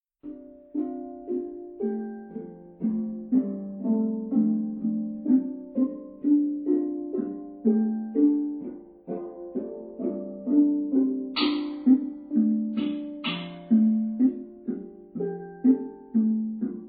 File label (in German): Improvisation